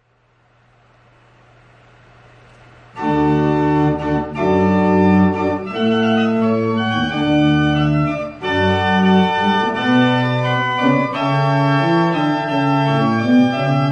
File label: royal fireworks, organ